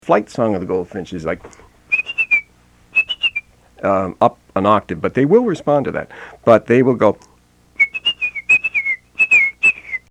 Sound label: goldfinch